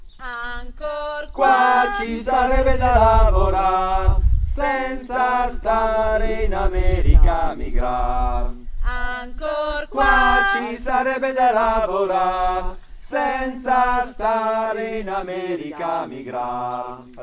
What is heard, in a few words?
TENOR; Pellafol